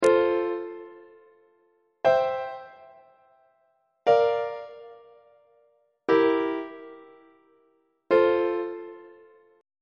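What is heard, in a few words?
f major leading tone imperfect authentic cadence; on treble clef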